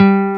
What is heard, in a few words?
Jazz Guitar Emperor G3; Club-50 - Foundations